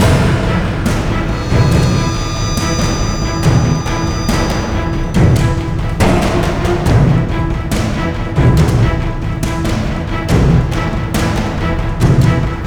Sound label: Fight; Koenig